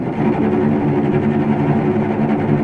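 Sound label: cello D2 tremolo; East West; The Ultimate String Collection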